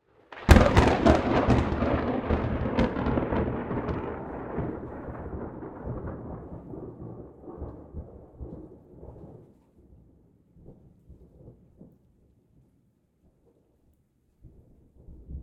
Thunder Extremely Close; InspectorJ; Essentials 01 Thunder

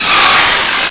distract, antlion; hl2

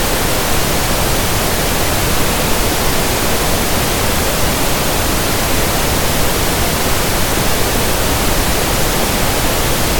diagnostic, noise, green; Eiravaein Sound

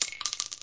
small tink concrete; weapons

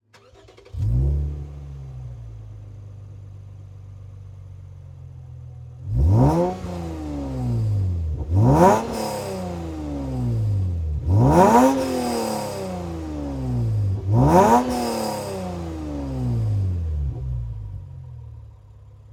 Engine, Start, Accelerate, Stop, Hood, race cars; Carlo Ascrizzi; Race Cars Essential Library

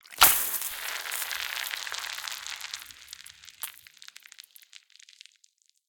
Acid, Drop, Harsh, Sizzle, Burn, Melt, Corrosion; Mattia Cellotto

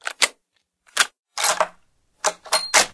sniper reload, weapons; hl2

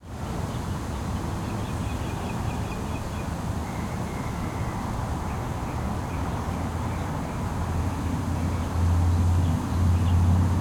Park Residential, Birds, Wind, Crows; STEREO; 2496SoundEffects; Surround In the Park 5.1

